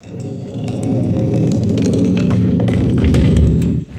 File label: heavy ball rolling on hardwood; foley